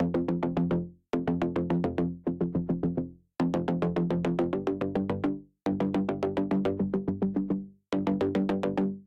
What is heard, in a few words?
Tension Bass